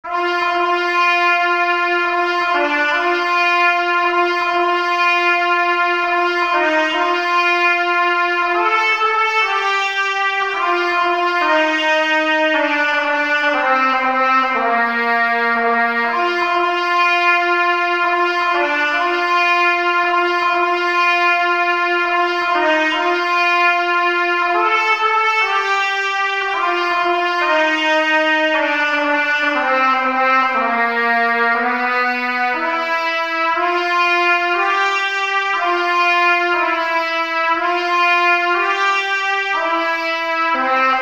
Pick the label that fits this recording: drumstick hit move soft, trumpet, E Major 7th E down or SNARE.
trumpet